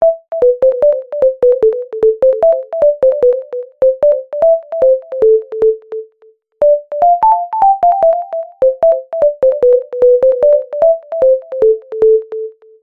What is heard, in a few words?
Tetris Ringtone